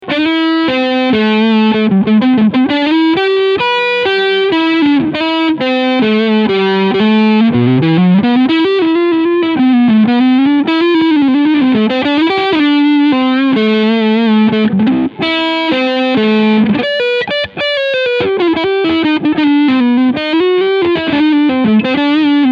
Epiphone B.B. King Lucille Overdrive Neck Humbucker